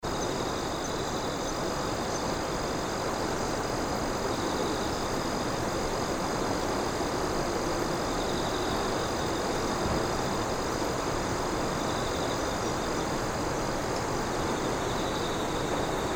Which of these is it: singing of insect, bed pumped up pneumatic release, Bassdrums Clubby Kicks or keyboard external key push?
singing of insect